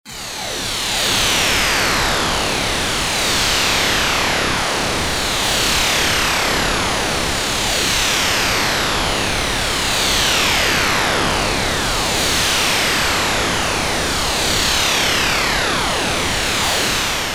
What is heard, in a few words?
energy